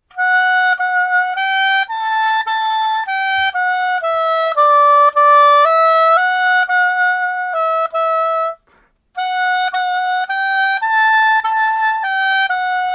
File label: Oboe